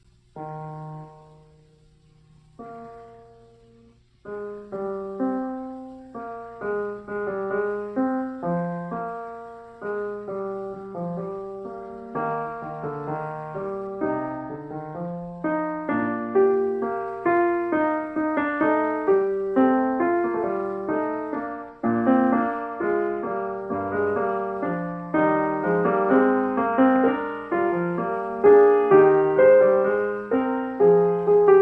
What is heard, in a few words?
Fugue 7 In E Flat; J S Bach